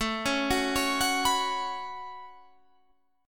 A 6th Add 9th A, guitar chord

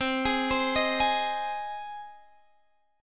C Minor Sharp 5th, Guitar, Standard; arpeggiated; JGuitar

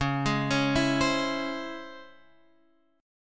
C sharp Minor Major 7th C sharp Guitar Open F; C#mM7 arpeggiated; x,8,8,8,7,7; JGuitar

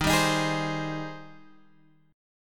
G sharp Major 7th

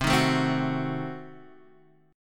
D sharp Diminished 7th C, Guitar

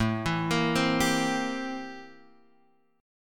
C 13th A; arpeggiated

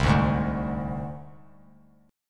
C Suspended 2nd Sharp 5th, Csus2#5 chord

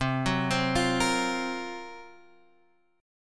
F sharp Major 7th Flat 5th C

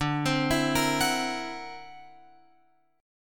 D Augmented 9th down Guitar Open F; D+9 arpeggiated; JGuitar

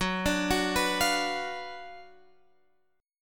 F sharp Major 7th Suspended 4th Sharp 5th F sharp

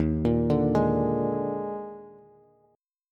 Eb Major 7th Suspended 4th, Bass; arpeggiated; chord; JGuitar

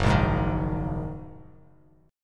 F sharp 6th Add 9th F sharp Bass Standard; strummed; JGuitar

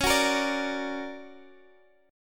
D Augmented Major 7th Db, guitar

